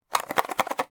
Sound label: shake small box, 菓子の箱を振る